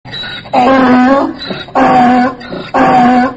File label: donkey sound, car horns